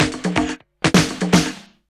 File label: 124bpm, House Perc Loops